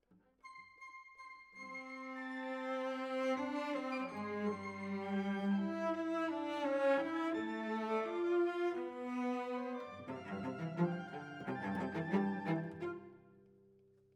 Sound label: Cellos